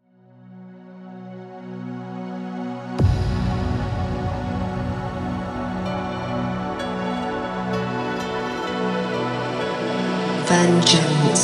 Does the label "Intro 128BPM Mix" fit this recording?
Yes